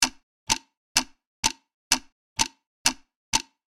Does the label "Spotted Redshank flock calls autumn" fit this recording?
No